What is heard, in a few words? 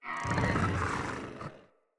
Infested Charger Idle